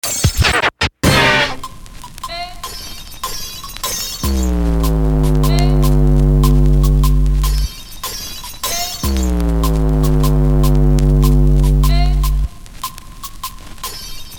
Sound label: instrumental; Glass Bridge Game; mongoosegame